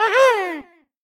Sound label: block voice; guodongrenwu